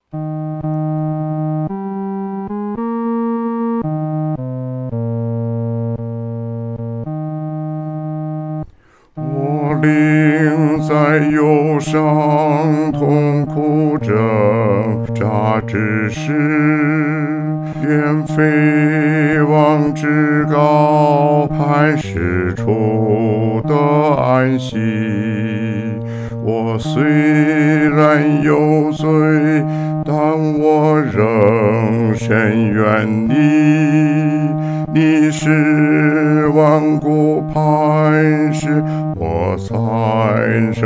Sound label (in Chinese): 独唱 第四声